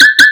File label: alarm